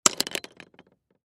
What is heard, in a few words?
Pocket Knife Drop Rocks; Drops; Foley; Blastwave